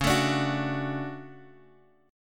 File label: D Major 7th, chord